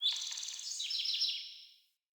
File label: Bird Song; Pothia Bird